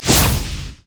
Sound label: hypercharge; LoL; jayce